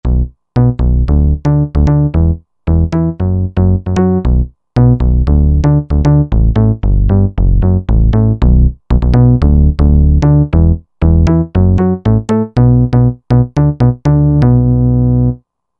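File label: Click Analog Bass, Roland JV-2080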